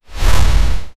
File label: a charge up and release